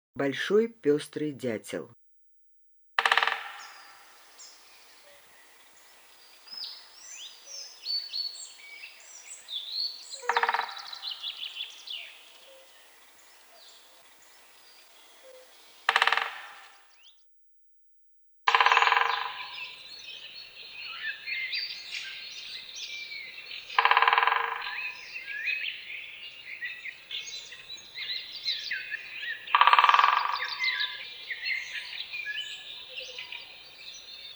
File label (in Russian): dyatel bolshoy pestryy golos